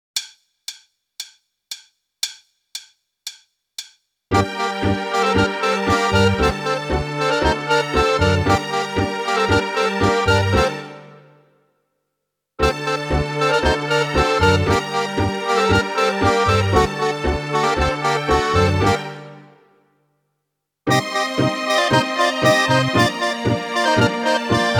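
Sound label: Tango, gitaar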